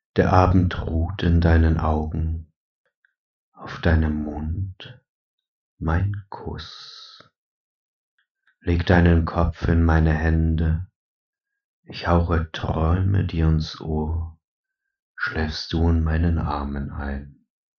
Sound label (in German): schlaflied